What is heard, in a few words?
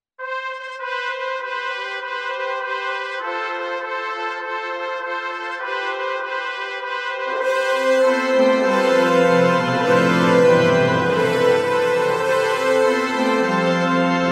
Recessional